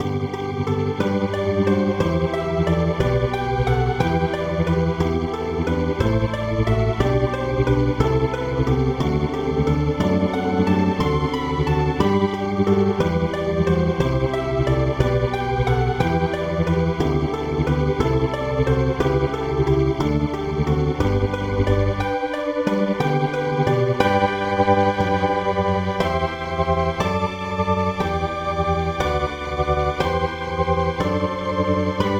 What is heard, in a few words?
calliope lead